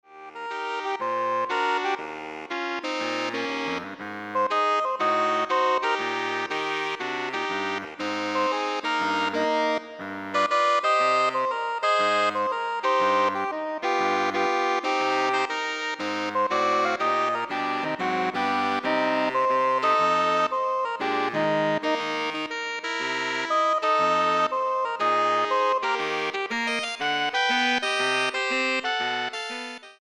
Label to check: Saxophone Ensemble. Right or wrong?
Right